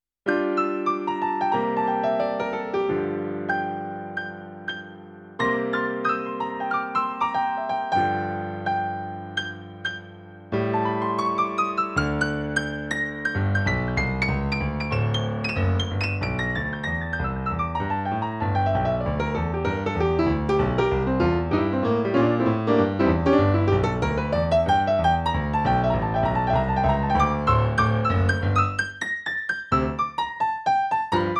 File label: piano